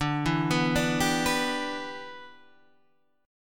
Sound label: G 6th D